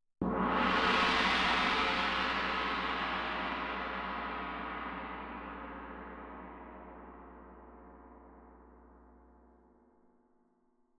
Tam-Tam; Tam-Tam Main

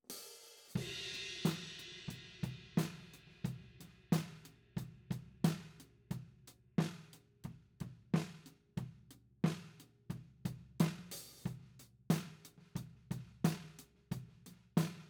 TOM

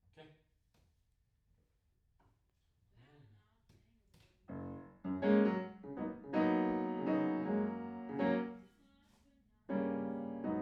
Piano Room

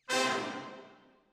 Decca, Jazz for piano

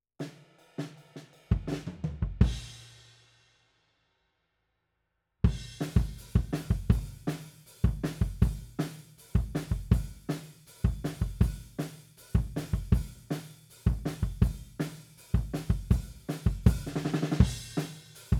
Kick Out, 160 BPM

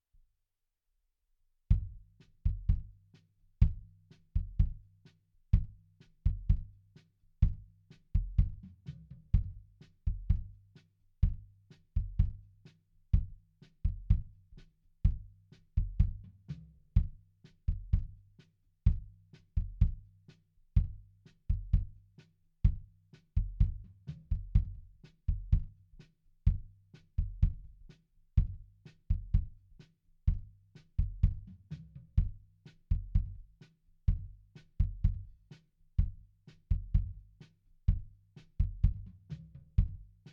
BD In

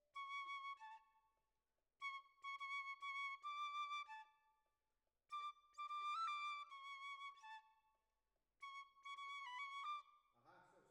Flute

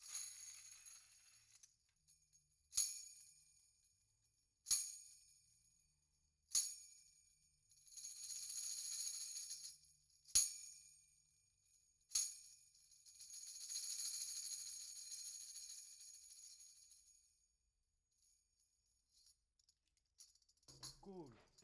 Tambourine

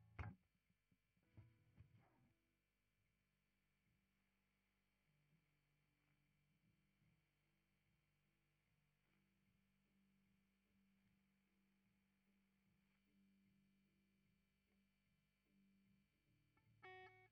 Gtr, Ragtime

